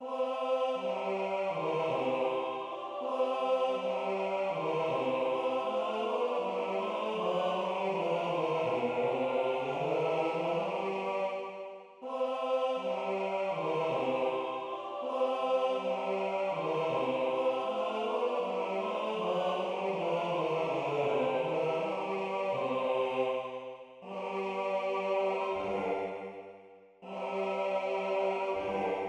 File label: Agnus Dei Bass